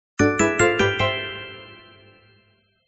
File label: switcher complete; gardenbloom